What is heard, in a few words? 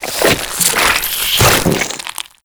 juicing, antlion; Half Life Alyx